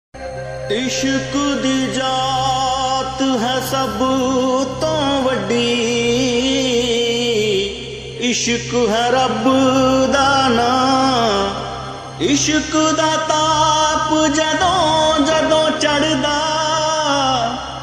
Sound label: sad ringtone; broken heart ringtone; mood off ringtone